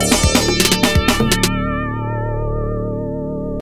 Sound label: Victory; Mortal Kombat; Genesis; Courtyard